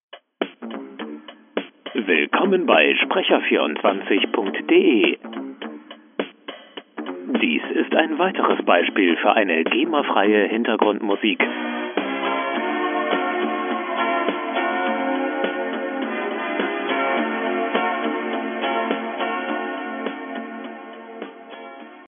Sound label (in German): musik